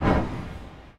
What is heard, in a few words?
Pack slide; Zenonzard